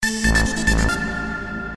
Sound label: Cool Echo